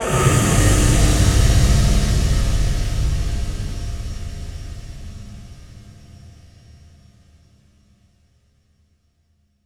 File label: Hits & Explode